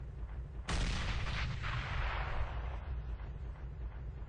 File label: beast crushed rock throw